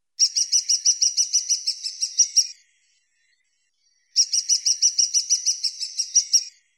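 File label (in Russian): malyy pestryy dyatel golos, lesser spotted woodpecker voice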